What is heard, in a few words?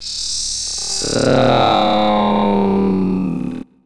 Fx Vox; Equipped Music; Loungin House